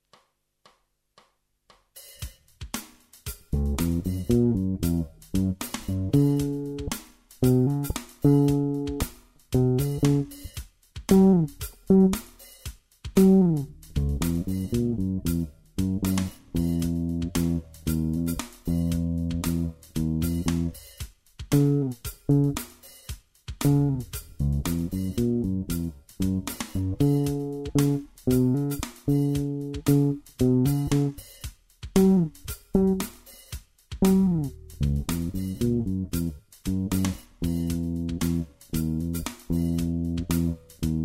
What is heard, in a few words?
Jazz Bass with Drums Treble Down